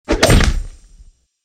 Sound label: Snow Ball Message; HTC; Snow Flake